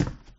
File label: minigame steps